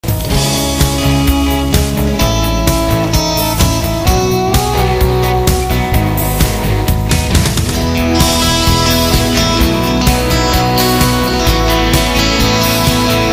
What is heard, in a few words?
magic guitar ringtone; i love you